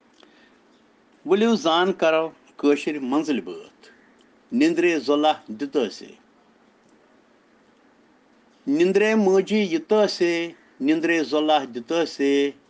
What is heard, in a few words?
Children Rhyms in Kashmiri